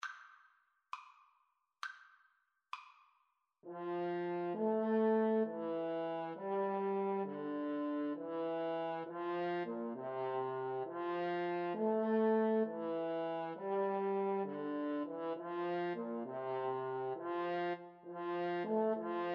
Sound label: mozart sonata331 HN, French Horn Duet